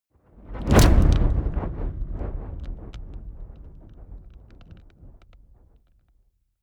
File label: fire whoosh long decay; swooshes-swishes